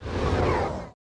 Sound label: MED MOV, SWISHSWO; Total Control - Action Cutz